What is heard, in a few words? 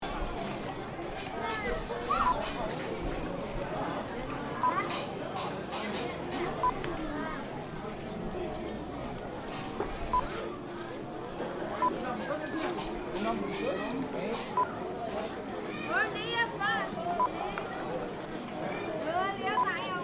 Indonesian Street Parade